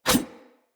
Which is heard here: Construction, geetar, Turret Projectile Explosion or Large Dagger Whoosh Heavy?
Large Dagger Whoosh Heavy